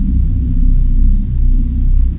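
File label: ambience; INC demo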